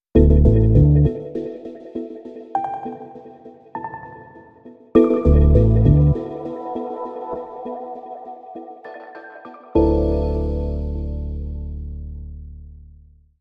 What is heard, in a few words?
Bumper